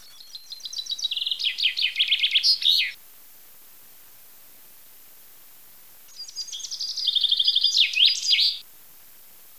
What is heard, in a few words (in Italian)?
fringuello canto